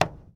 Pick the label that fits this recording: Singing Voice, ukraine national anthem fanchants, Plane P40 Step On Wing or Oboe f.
Plane P40 Step On Wing